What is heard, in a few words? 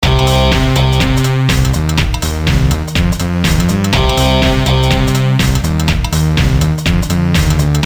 Loop